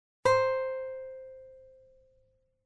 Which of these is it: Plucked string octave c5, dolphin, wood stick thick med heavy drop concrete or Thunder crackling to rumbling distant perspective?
Plucked string octave c5